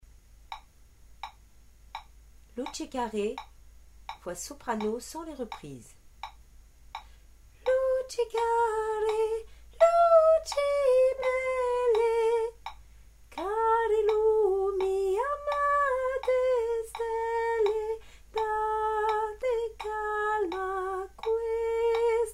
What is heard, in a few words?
soprano; luci care